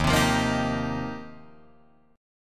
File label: D sharp 6th Add 9th, D sharp, down, Guitar, Drop D